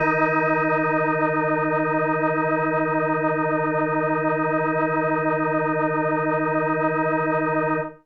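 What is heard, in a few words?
Indie Organ Kawaii D#1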